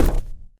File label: cardboard, footsteps survivor walk; Left 4 Dead 2